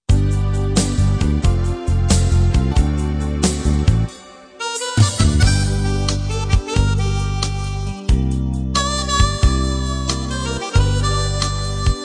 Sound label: Blues